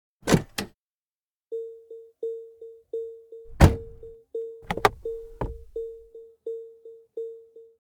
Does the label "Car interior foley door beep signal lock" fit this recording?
Yes